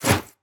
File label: Player Dash